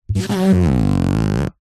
foley rubber rub squeak; zapsplat

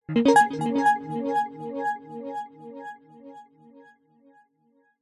multimedia game sound nature game generic

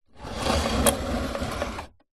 foley wood box small slide short hard ground; zapsplat

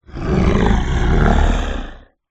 horror evil demonic growl

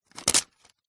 paper raffle ticket book ticket rip out; foley; zapsplat

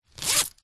foley purse pouch leather coins inside zipper

